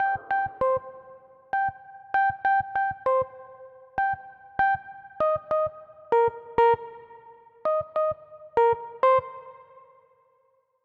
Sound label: Melody Pluck Dancehall Afrobeats; midi wizard